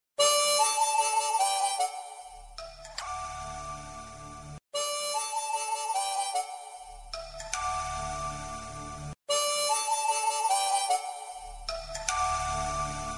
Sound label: Mouth organ